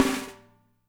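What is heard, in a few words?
SN, drums; DRY KIT; Spectrasonics; Burning Grooves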